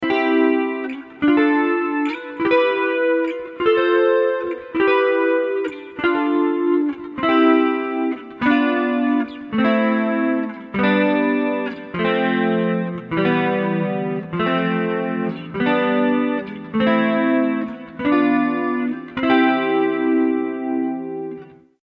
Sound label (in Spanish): Tonicas en 4ta cuerda